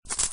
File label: tool Unlock